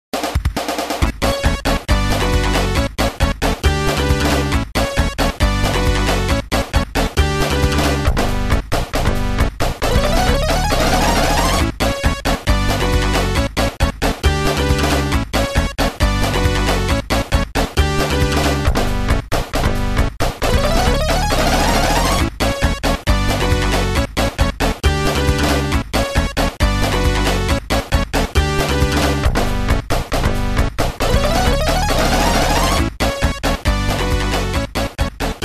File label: invincibility bgm; sonic2